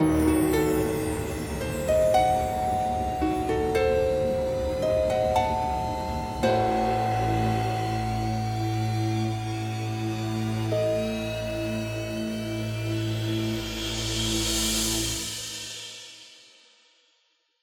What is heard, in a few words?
Arpeggio